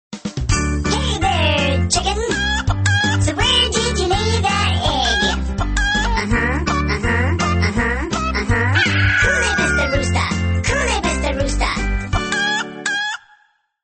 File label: muzical rooster ringtone; android